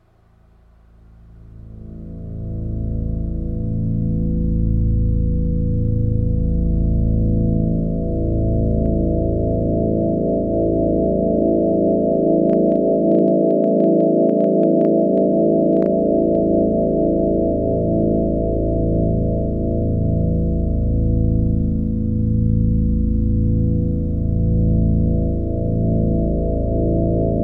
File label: churn; iPhone